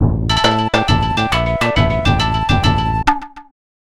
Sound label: Game Over; Legend of the Mystical Ninja; Ganbare Goemon